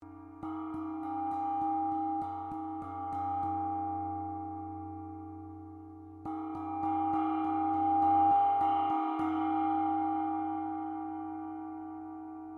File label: GONG CHINA SMALL CRESCENDO SEQUENCE, Chinese gongs; Cymbals; Musical